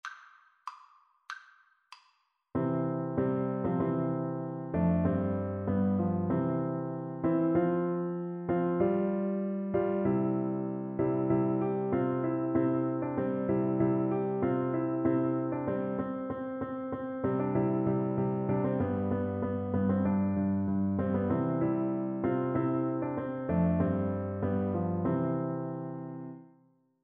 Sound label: baritone, Baritone Horn